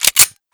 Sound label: slide pull, weapons USP